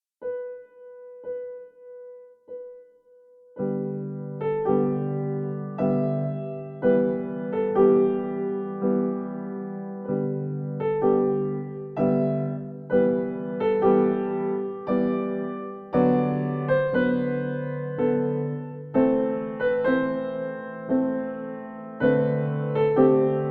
Piano Piece

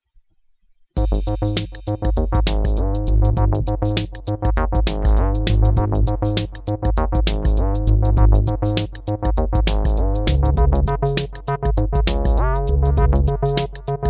acid blues, main mix

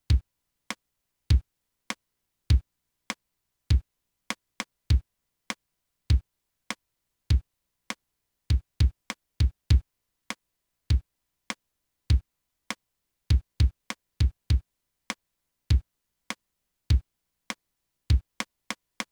Slow drums

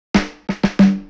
Fill; The Clark

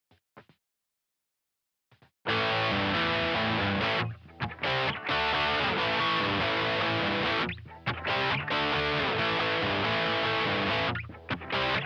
Lazy Les Paul in a rock stack; admiralbob77

